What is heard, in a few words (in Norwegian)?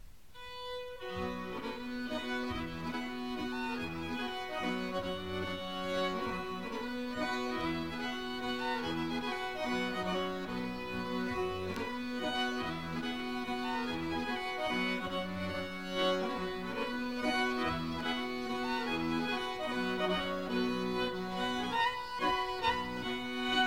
polsdans